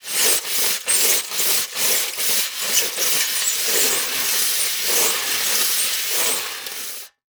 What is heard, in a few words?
Industry Tool Wire Brush scrape Metal Sheet